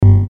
Hit Soft Digital Bass Bump; Blastwave

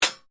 METAL CRASH SMALL; Metal Sound Effects; Metal (Sound Effects:Materials)

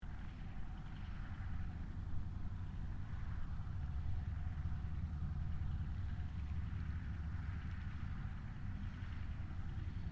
AIRCRAFT CA-13 BOOMERANG SHUTDOWN DISTANT STEREO; Airplanes

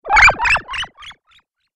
Cartoon Magic Spell

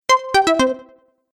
Stage Level Complete; Video Game Sound Effects

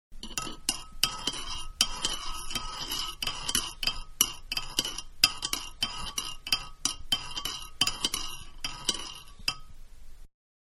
Coffee Stir In Mug With Spoon; Kitchen; Household; Manhattan Production Music

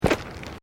Footsteps Boots Ceramic Paving Tiles Step; Footstep Foley; Footsteps (Sound Effects:Human)

